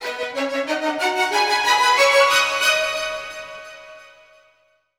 STRGS, Roots Funk; USB Soundscan vol.41; AKAI

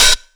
125 DRM, Fresh Disco House; USB Soundscan vol.20; AKAI

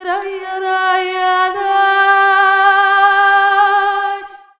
VOX, Balkanish Traditions

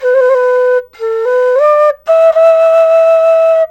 SERULING, Heart of Asia

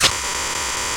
PERC FX, Dance Drums; Best Service; Dance Mega Drums

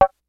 DANCE KIT, DRUMS; Kid Nepro; Elite

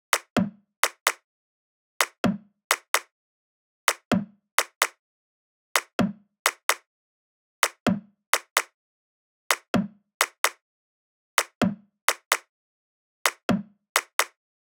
loop perc 128; Seismic Loops

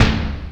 XD5 Kick, Kawai Drums

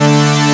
LOW OCTAVE; E-MU Formula 4000 Series Vol. 2

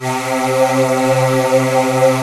AIR VOX C2, VOICE; AKAI S-Series CD-ROM Sound Library VOL-7

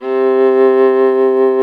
STR VLA, Viola Solo, marc

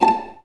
VA PIZZ A2, Orchestra; Best Service; Peter Siedlaczek's Advanced Orchestra

